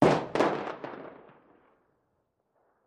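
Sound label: 2 Oz Black Powder Bomb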